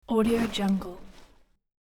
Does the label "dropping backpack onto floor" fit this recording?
Yes